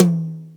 short reverb tom sample e key, Royality free tom single hit tuned to the E note; tom drum samples